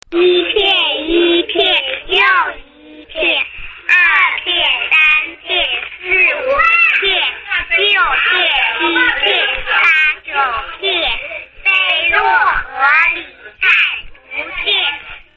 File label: kids